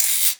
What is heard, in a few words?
EQ Perc, Percussion Mix